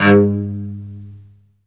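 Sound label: SOLO cello, Strings; Synclavier; ILIO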